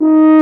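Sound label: brass, F.Horn Solo; Roland L-CD702; F.Horn 4 Sh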